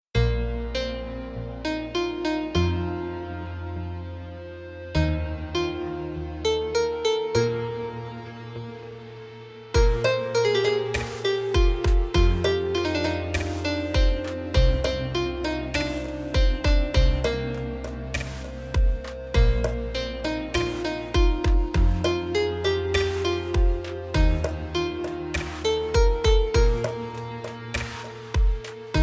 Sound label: country vande mataram piano